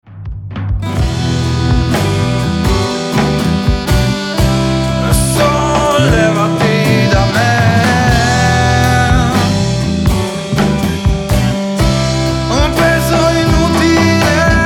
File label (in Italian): Armonici